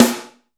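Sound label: WOOD snare; East West - Phat & Phunky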